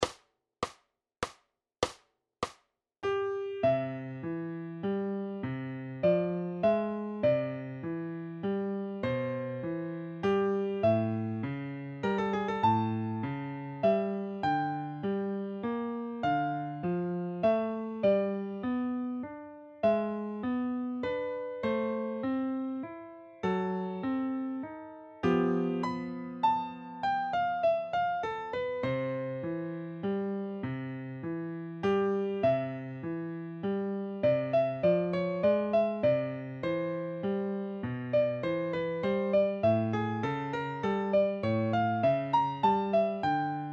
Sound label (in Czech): Nokturno; Nokturno v Eb-dur; Hity klasiky 1